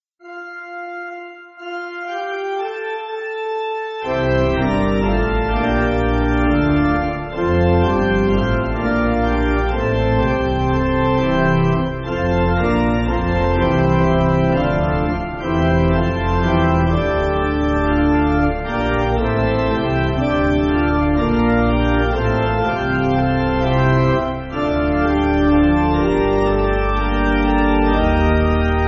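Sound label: Pipe Organ, church backing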